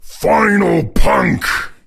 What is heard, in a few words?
Final Punk; friday the 13th killer puzzle